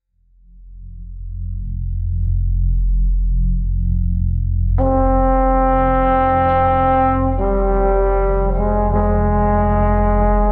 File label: lone trombone dread